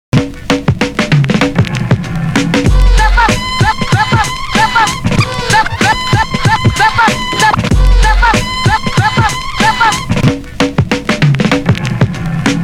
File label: breaks; Super Duck Breaks